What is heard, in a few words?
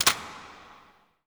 DOOR latch; doorSounds